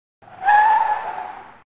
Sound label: Lincoln Town Car in skid; Cars